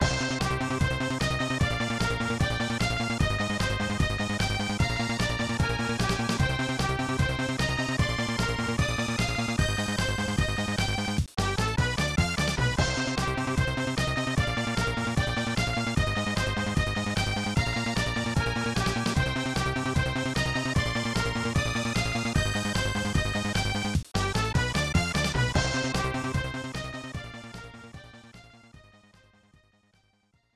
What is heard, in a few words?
PK Shootout; J.League Excite Stage 96; SNES